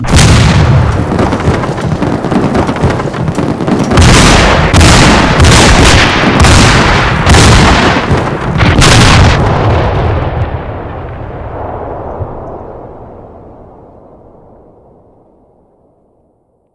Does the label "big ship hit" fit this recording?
Yes